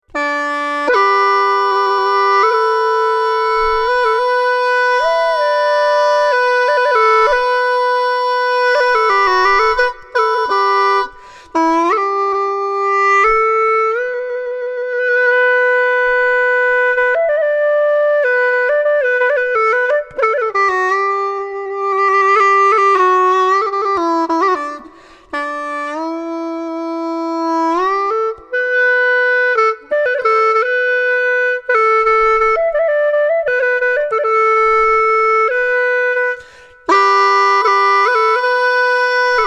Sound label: Hulusi